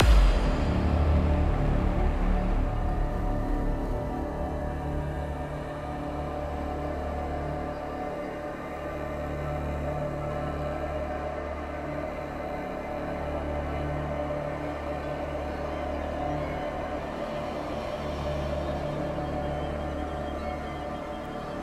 Boss Main Music Loop

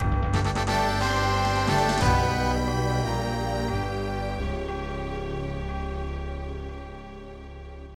Fanfare, Roland SC 55; ms-dos; Stonekeep; windows

